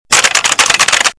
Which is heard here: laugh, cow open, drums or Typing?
Typing